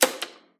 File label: stapler; soundscrate